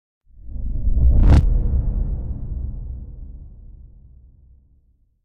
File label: Impact Deep Reversed Air Hit